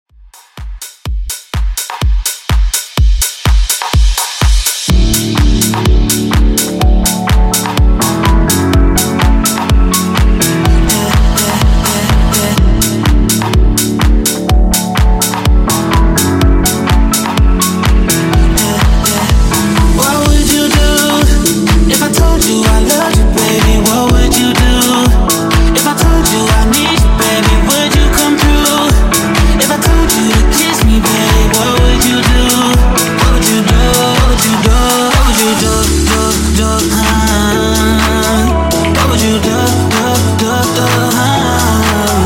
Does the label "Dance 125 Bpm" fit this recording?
Yes